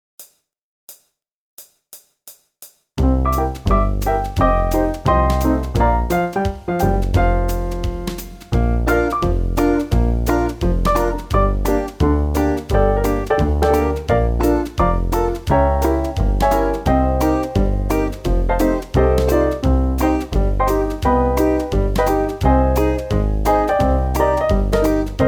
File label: acct, atelier jazz